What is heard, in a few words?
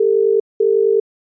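ringback tone Australian; iOS Sounds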